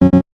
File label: Denied